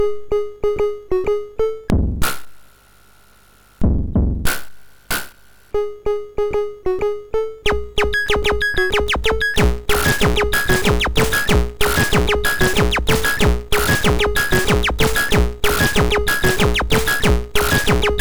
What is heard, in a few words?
Best Beat, sid MOS6581R2